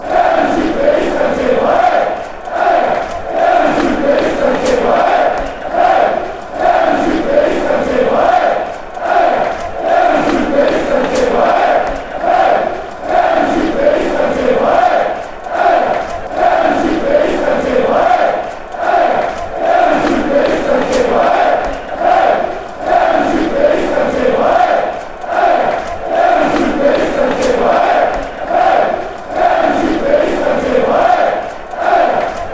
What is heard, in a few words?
hey hey fan chants